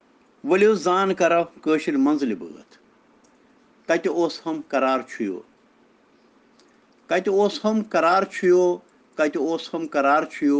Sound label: Children Rhyms in Kashmiri